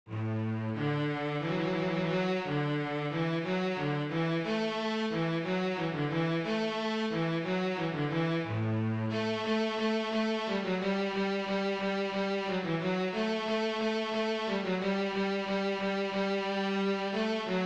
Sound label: Hornpipe